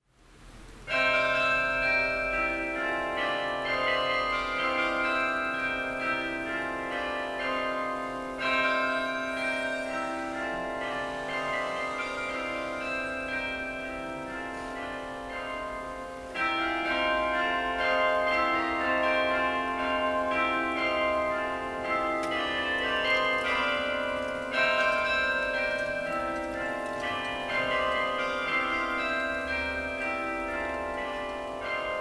concert de carillon; CHAMBéRY

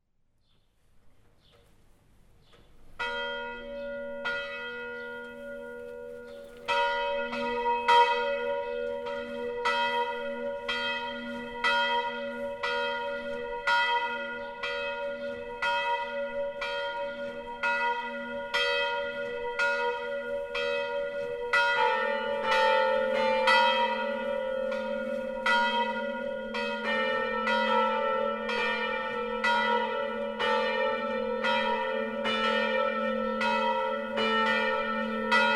Gruyères cloches

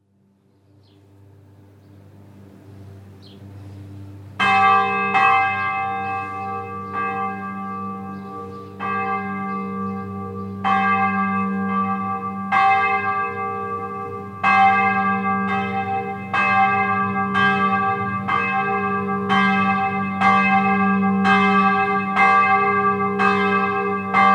Villarimboud cloche

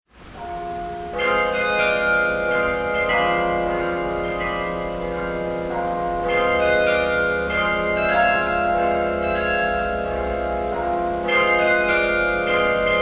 concert de carillon; CHAMBéRY

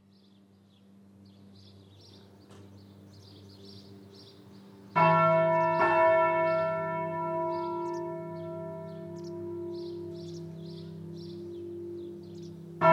Villarimboud cloche

